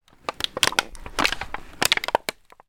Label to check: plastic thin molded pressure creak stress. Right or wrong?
Right